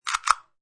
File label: Lock